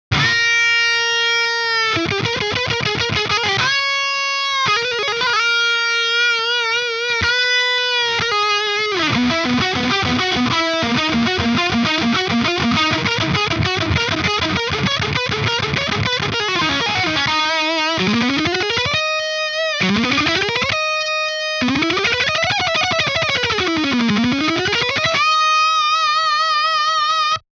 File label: OVERDRIVE LEAD; SaturnLO